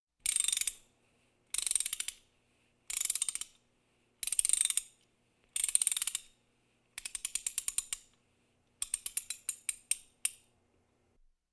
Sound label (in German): Hausarbeiten Heimwerken Sperrklinke